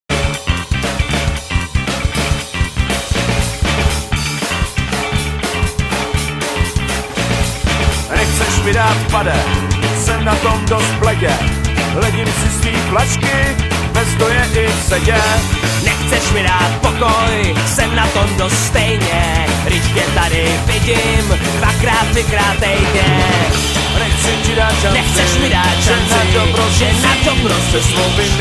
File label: music; rez